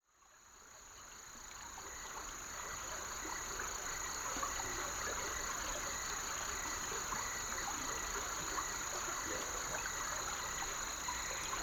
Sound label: Creek Chorus Insect Lullabies; Aravaipa